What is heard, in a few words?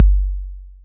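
808 bd, bass drum, drum machines; 808 Extended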